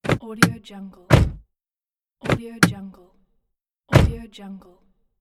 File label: car door open close; fx-library